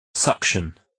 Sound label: suction